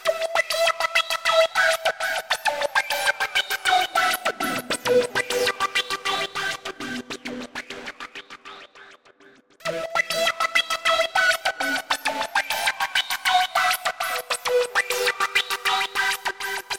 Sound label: distorted texture laser seq; synth; instruments; loops